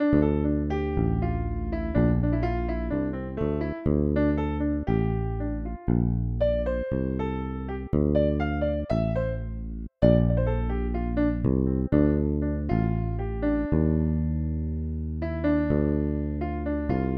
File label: Piano Bass